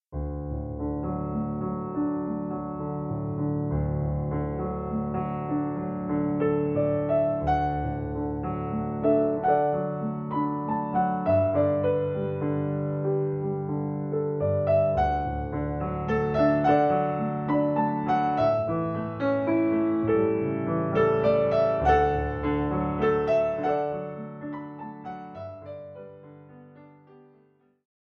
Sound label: adage faster, traditional irish air; londonderry air